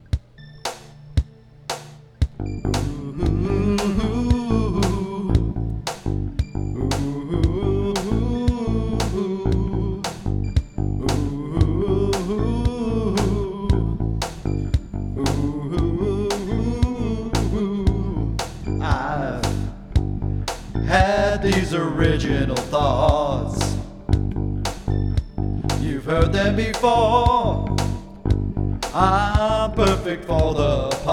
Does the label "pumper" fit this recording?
No